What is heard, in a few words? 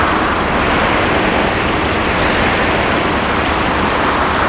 Clown Trike, clown trike pedal loop; Ringling Bros. and Barnum & Bailey; Wii